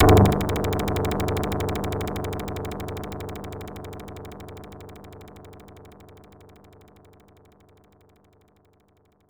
Drumroll; Great Circus Mystery The Genesis

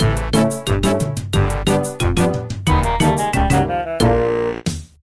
failure; Speedy Gnome; runescape 3 gamerip

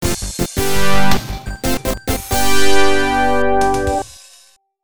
Pleasurable Melody; GP Rider; arcade

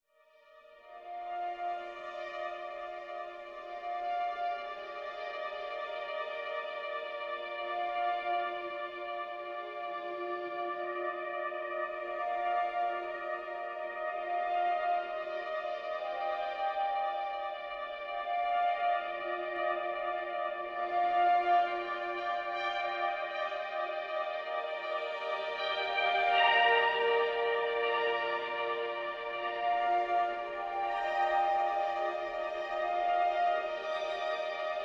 Amb String Drone

